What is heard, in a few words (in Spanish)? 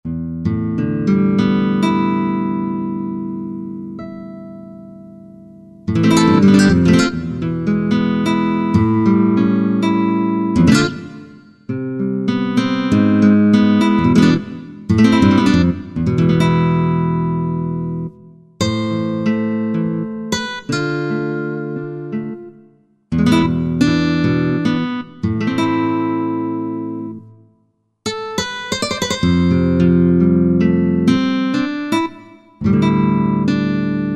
Guitarra Espanola; Korg TR-Rack